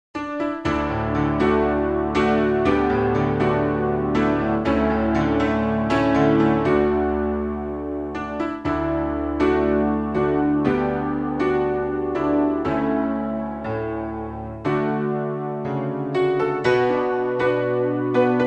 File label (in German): Piano-Version